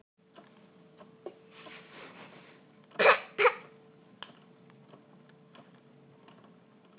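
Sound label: baby hungry, female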